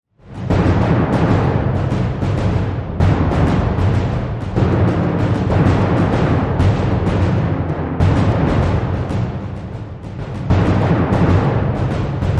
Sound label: Driving War Drums